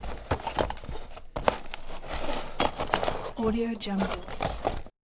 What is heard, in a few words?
gift opening